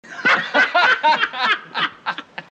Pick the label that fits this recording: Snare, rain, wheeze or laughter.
laughter